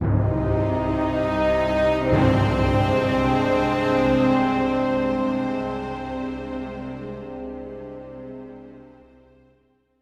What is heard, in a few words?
Music Segments Walk Big Cold 64bpm End; asterix obelix slap them all 2